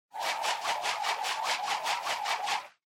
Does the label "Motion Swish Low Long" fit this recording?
Yes